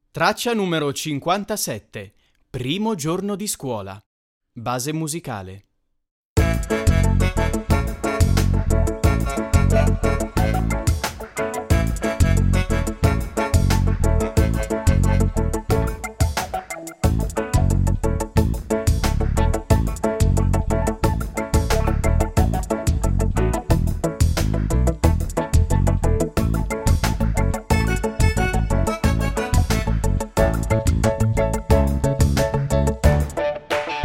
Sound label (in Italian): base musicale; infanzia